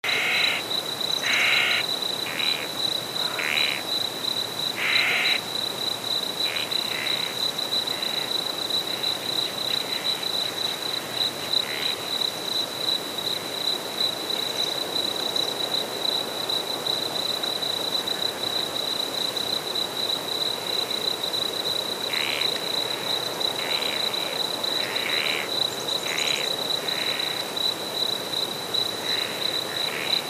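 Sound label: Ambience Night Insects Cricket Swamp Loop; the unfinished swan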